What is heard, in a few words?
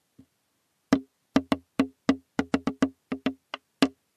tutu drum